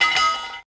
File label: pipe hit concrete; objects; Star Wars Obi-Wan; XBOX